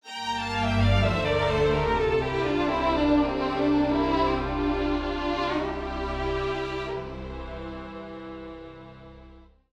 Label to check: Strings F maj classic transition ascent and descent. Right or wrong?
Right